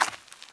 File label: Gravel; blindside